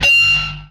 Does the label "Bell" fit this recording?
Yes